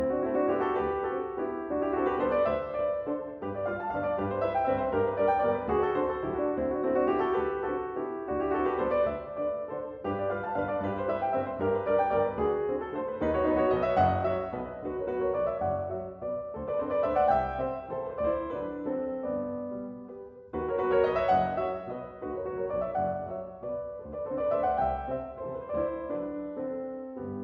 Waltzes, For Piano, four Hands, G Major; Brahms

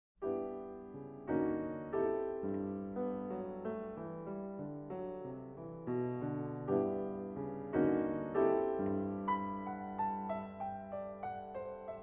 Suite For Horn And Piano, Slow And Sweet

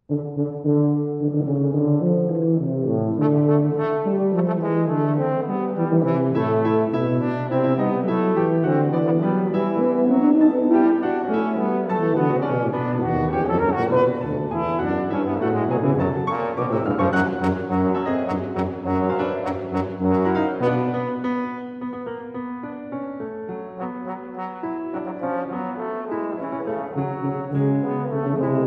Suite for Horn, Tuba and Piano

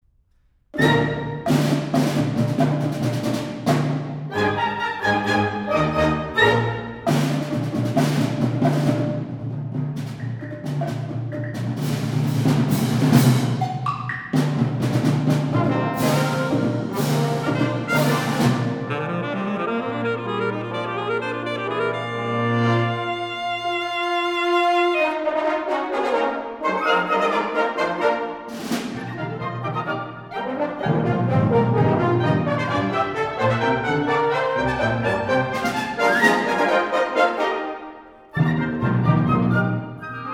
Concerto For Alto Saxophone And Wind Orchestra

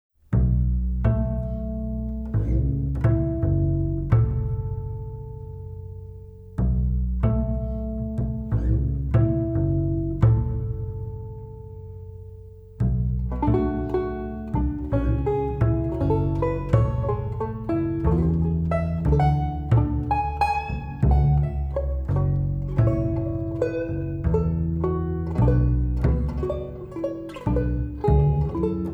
Waltz

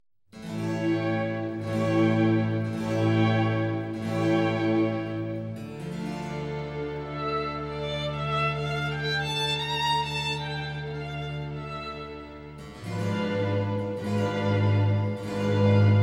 Concerto For Two Trumpets In C, Largo, Strings

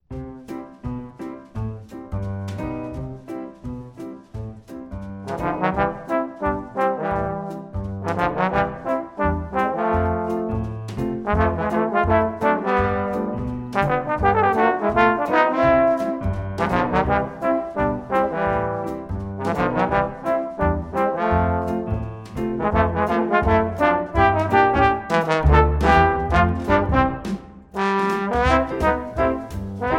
Jingle Bells, Trombone

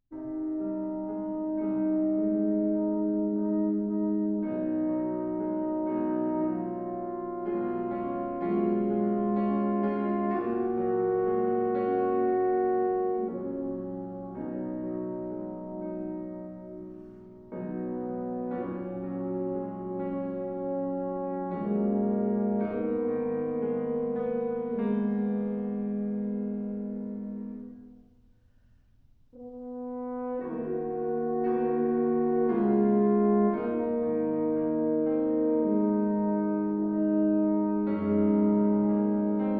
Suite For Horn Tuba and Piano

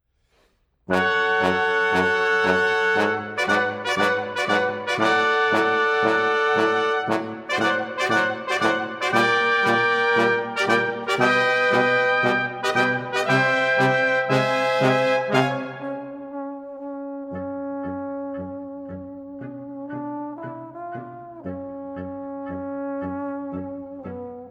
Foxtrott Blues; Jazz Suite No. 1

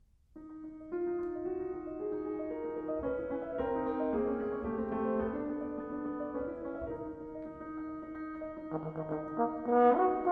Sonata For Horn & Piano, Allegro ma non troppo

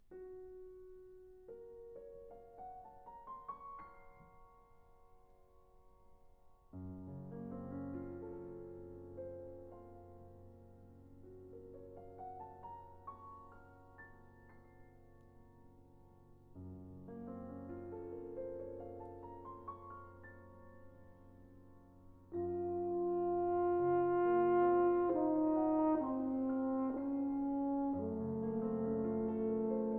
Divertimento For Horn, Tuba And Piano; Mvt. 3. Nocurne

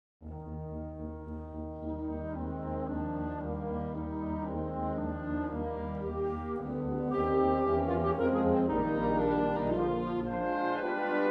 Concerto Per Flicorno Basso; American Wind Symphony

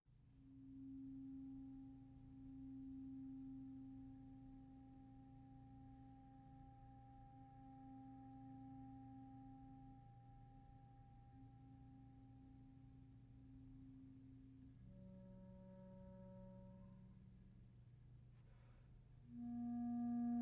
Solo Clarinet

